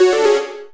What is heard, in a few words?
Power down